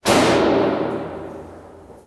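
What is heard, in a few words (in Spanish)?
Disparo Cañón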